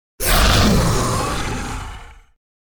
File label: Growl Beast Attack - Monster Growl; Hollywood Edge; sound designer's toolkit v2